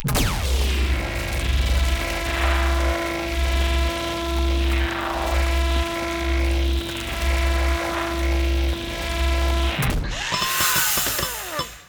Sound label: Mining Laser complete